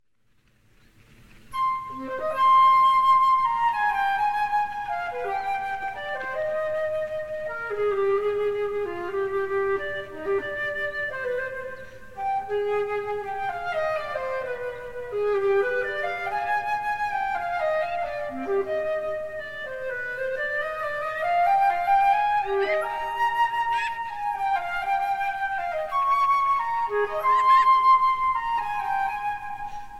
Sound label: Suite in C Minor, Allemande; Suite No. 5; BWV 1011